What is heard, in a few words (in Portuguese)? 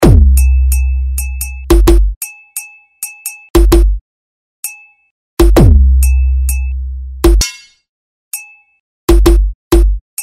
beat funk